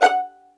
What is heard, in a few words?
F#5 PIZZ, Violin; Q Up Arts; Denny Jaeger Master Violin Library; PERFORMANCE